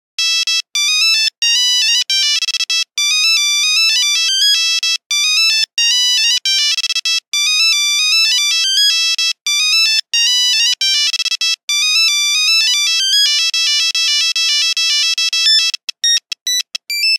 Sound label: Nokia Buzzer Raptrap; Windows Phone apps